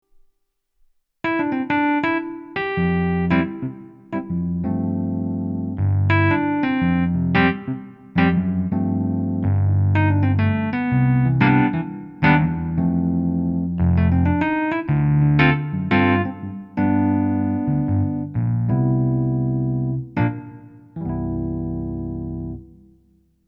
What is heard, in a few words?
Kawai CN14 E Piano